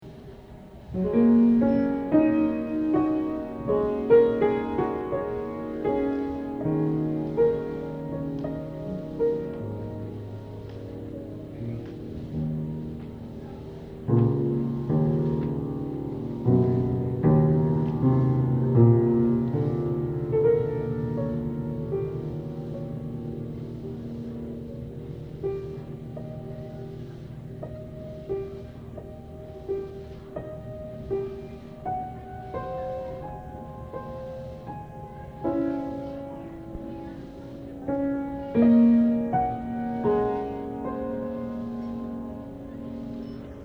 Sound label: Piano